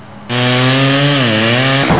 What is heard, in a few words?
saw